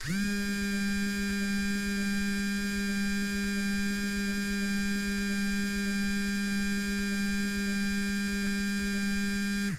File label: toy vibrate medium